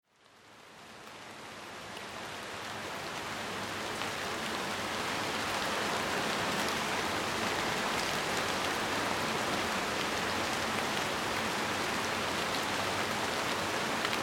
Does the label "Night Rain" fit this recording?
Yes